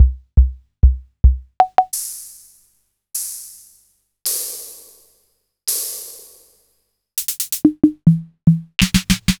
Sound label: Disco; Solton; Disco-64